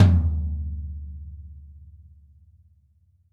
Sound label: DW Red Maple Tom Room; 2002 DW Red Maple Kit; One-Shots; Sonic Foundry (Sony Creative Software); Vital Drums